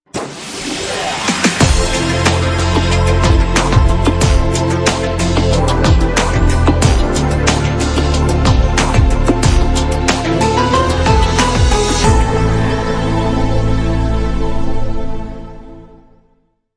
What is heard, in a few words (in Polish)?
jingiel synth